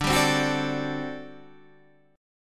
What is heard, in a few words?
G Major 7th Suspended 2nd Suspended 4th